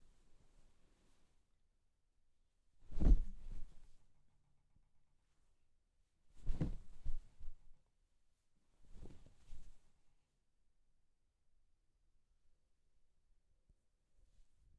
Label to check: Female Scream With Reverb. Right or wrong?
Wrong